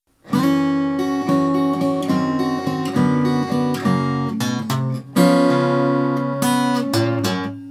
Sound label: blues